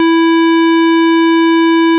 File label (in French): somme cos